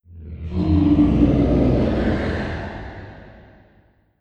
distance dragon attack mouth; Stellaris; guardians

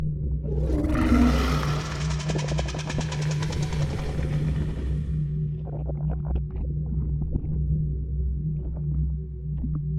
aqudragon reanimated idle; Stellaris

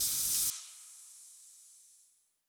mega shipyard electricity amb

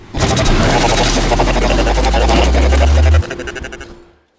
event robo; Stellaris; synthetic dawn

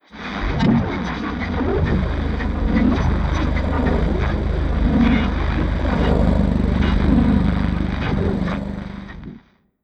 ai crisis room hits; Stellaris; synthetic dawn

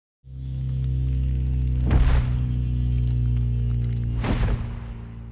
pro ship colossus idle closing; ships; biogenesis

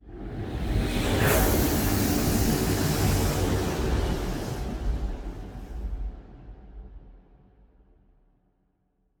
ship juggernaut move; Stellaris; machineage; ships

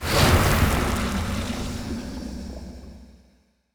toxic planet, ambient; Stellaris

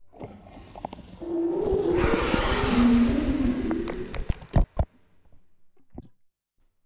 aqudragon reanimated death; Stellaris

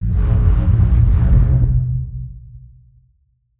distance arc emitter windup, weapons; Stellaris

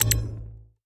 ui holograph in; Stellaris; paragon

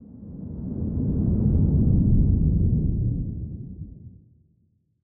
kaiju adult tail swish long low; biogenesis

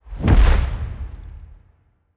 large wing flap; biogenesis

